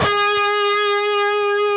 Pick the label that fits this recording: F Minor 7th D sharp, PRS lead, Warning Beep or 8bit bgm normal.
PRS lead